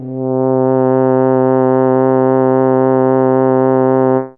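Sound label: Trumpet Hi C3, Fairlight CMI IIe; Brass; Brass1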